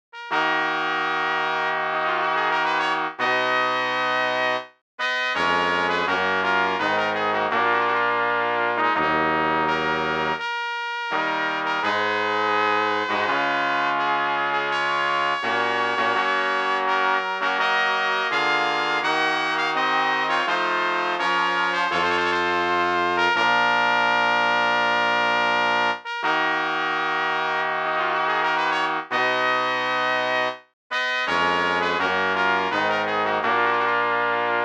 all cornets; Locke

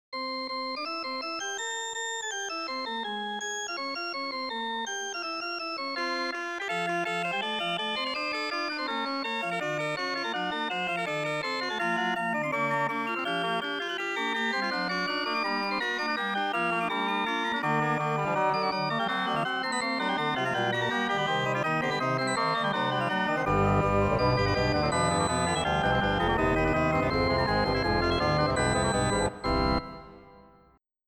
penny Jingle

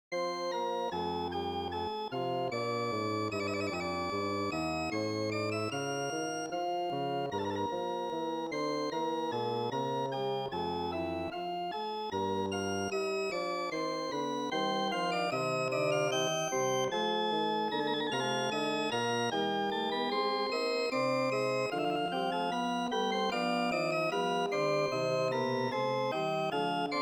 Air 21edo

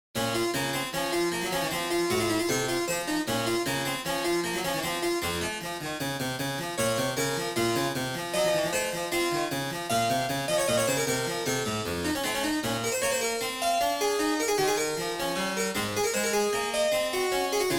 31b A Prelude; Fokker; Meneghin

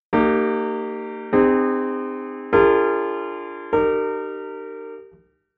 17 cadences, 17-ET

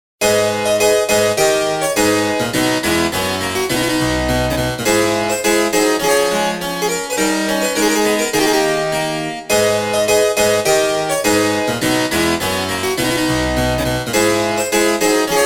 haprsichord

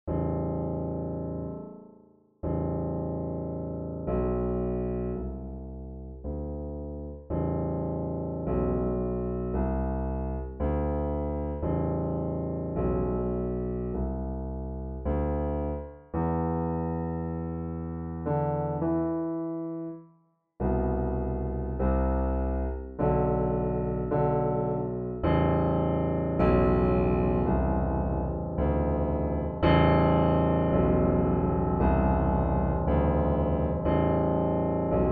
12 equal six octaves

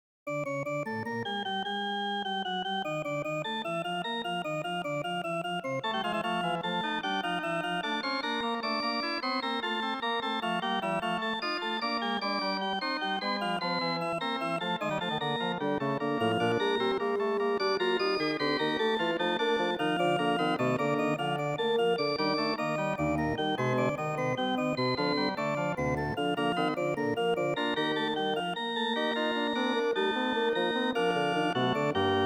15ET 3 Parts Fugue; AA Hunt

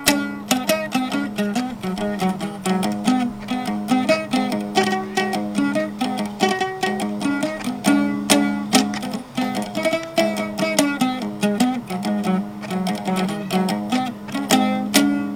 lute

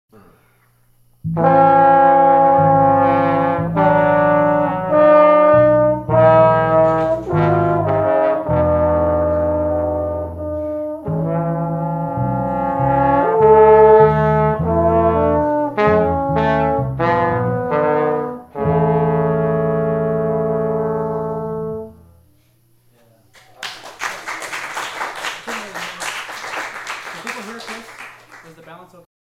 Quarter tone chorale; praxis

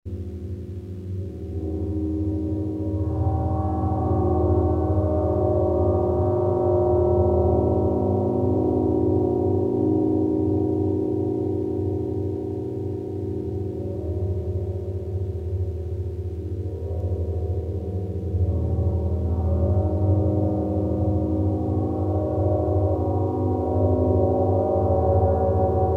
prepared aeolian harp balls; inharmonic series

